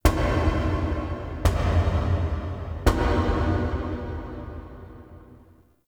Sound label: Knocks Large; Ambience; Warframe; Entrati